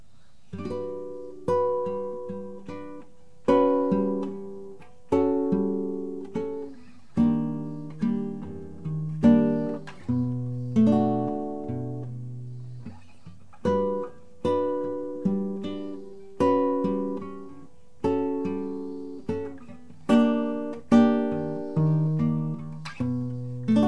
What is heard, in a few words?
treble bossa